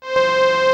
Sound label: bomba C5, Glitch Toys LoFi; Ableton Foundation FreePacks